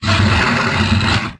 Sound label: vox attack